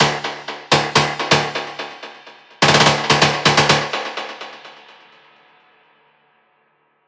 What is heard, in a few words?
Serum Dun Dun Stab